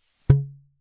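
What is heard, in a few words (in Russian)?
картонная трубка поп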